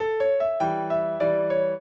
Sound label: piano; Mozart